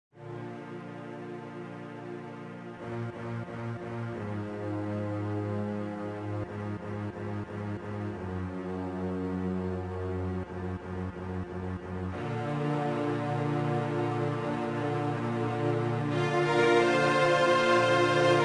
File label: Fanfare; zelda; z3